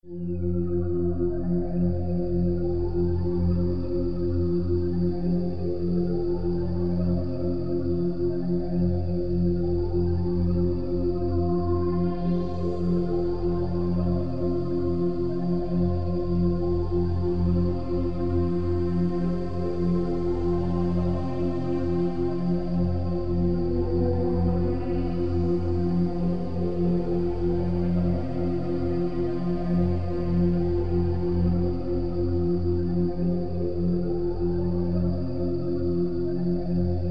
Amb Sound Scape Deep chord, Space effects; Ambient SoundScapes

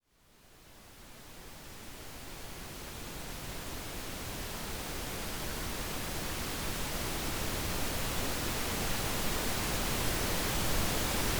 Pink Noise - Meditation; RobinHood's AS Sessions

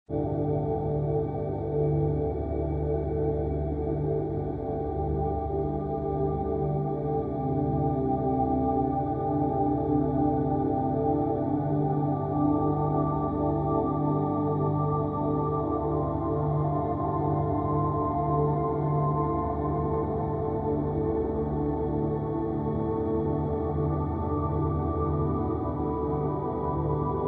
Angelic ambience, Sparse indian flute; Warm Ambient; Ambient SoundScapes

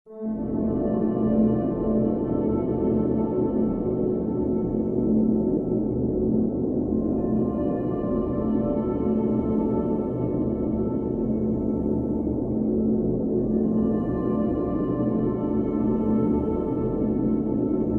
Amb Sound Scape Mysterious melody, Hollow Wind; Ambient SoundScapes

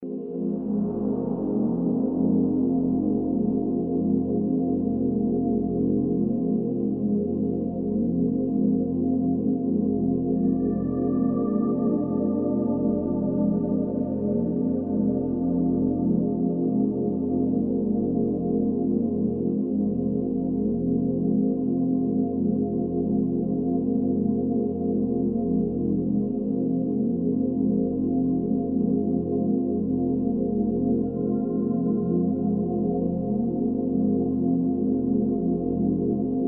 Chords, very tranquil; Warm Ambient; Ambient SoundScapes

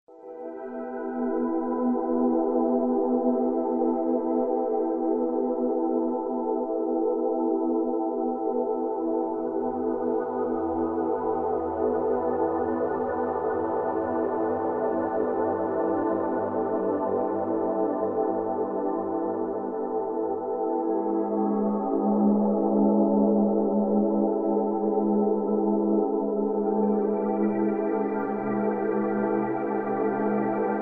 Very warm, shimmering synth; Warm Ambient; Ambient SoundScapes

